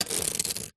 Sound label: household hairbrush scrape bristles on wood